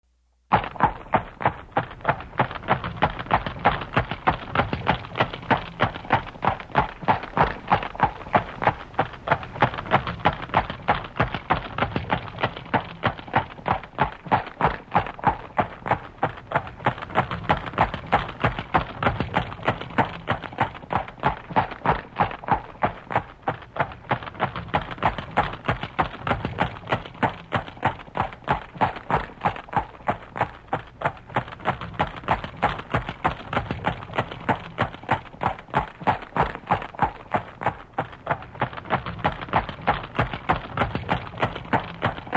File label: Horse canter on stone surface